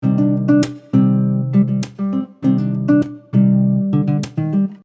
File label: Percussive Cmin 100bpm, Soft Nylon Guitar; Percussive Patterns; MG Soft Nylon Guitar - MIDI Pack